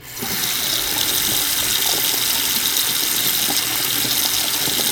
FAUCET RUN, KITCHEN; 1000fx

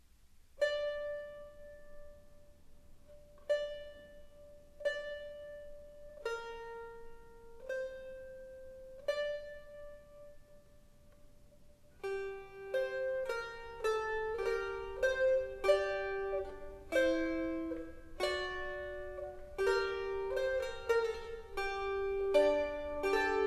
Praeludium (Cittern)

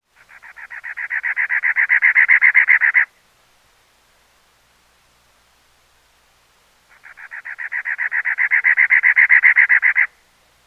Cactus Wren Rhythmic Chirps; Birds And Animals

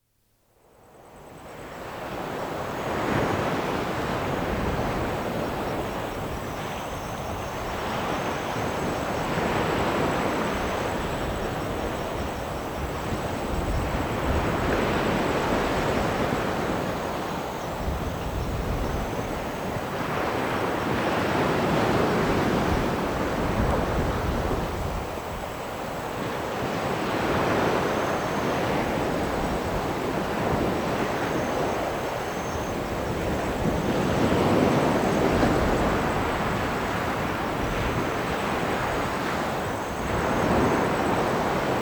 Waves Washing On Shore At Night; Dr. Sound Effects; 210 Nature Sounds - 20 Hours of Relaxing Natural Ambiences for Meditation and Sleep

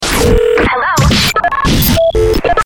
phone effects

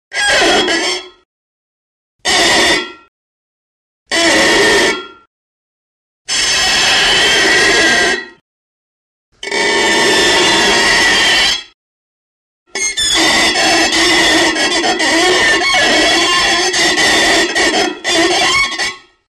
Metal Strains Bend & Wronk, Sci-Fi; Hollywood Edge Foley Sound Library

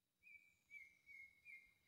Jungle Birdsong

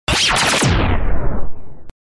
Ricochet Shot; DJ sounds

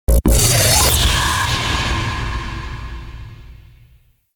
Zap Cymbal; DJ sounds

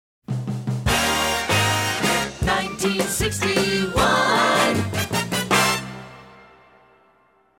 Hits, Drops

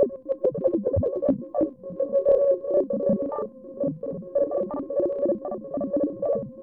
BABBLE, ANALOG SYNTH; 1000fx

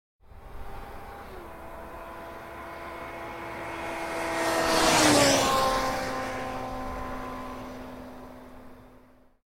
Boy Racer; 100 sound effects

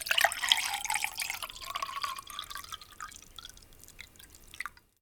POURING, KITCHEN; 1000fx

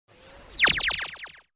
Synth High-Pitched Tone By w Echo; Electronic Sci-fi

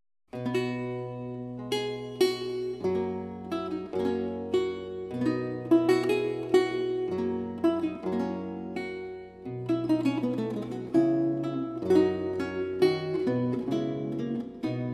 Recercar, on renaissance lute

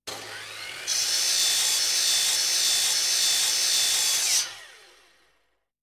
Bench Grinder; 100 sound effects

